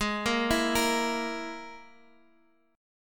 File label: E Major Flat 5th G sharp